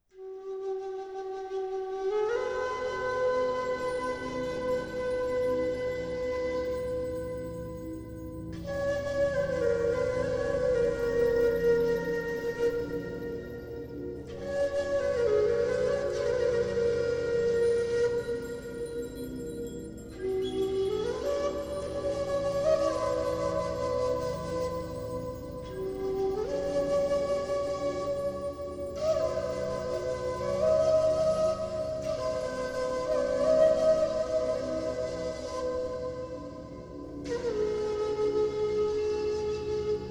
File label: flute 432 hz